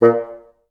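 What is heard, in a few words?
staccato A1, Advanced Orchestra; Best Service; Peter Siedlaczek's Advanced Orchestra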